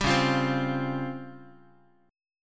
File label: C sharp Major 7th Flat 5th Guitar; C#M7b5; strummed